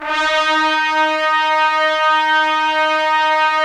Trumpets; Roland - LA Composer vol. 4

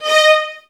violins F; ILIO

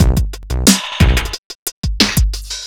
Speed loop Breakdown, Drums Beats

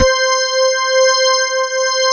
ALL STOP, CHURCHY

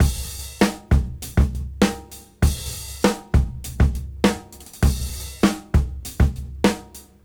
Break 99 BPM; AMG; Keith LeBlanc Phat Butt Jams

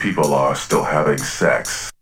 SEX; Zero G; Man Machine

